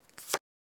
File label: card play; Hearts